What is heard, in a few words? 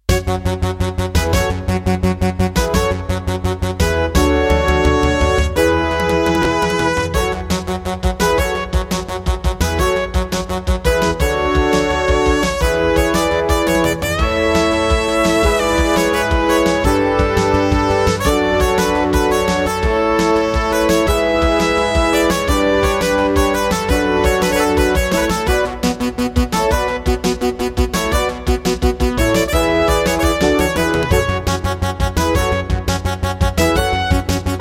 Intro, MT32 ROM; Munt; 4D Sports Boxing